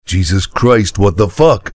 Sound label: soldier negative vocalization